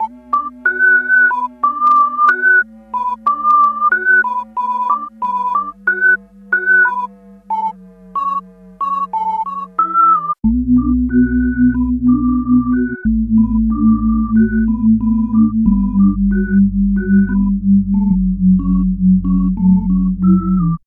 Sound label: organelles, Loops Electronic